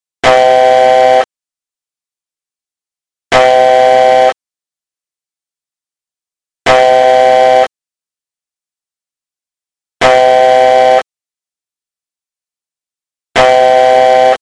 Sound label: altitude alert classic